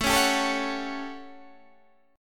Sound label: A 13th A down Guitar Half Step Down